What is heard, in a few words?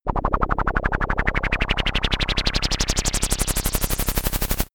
wobble; bewegung; comedy-cartoon; soundpool